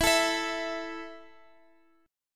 A 5th E, guitar chord

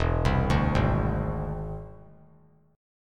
E Augmented 7th E down; E+7 arpeggiated; JGuitar

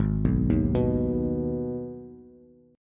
G sharp Minor G sharp down Bass, chord; G#m arpeggiated; JGuitar

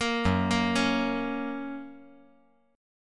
Gb Major

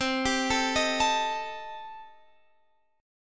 C Minor 6th, chord

